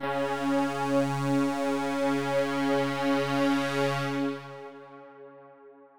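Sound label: analog C#3; analog server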